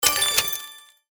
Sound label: cashout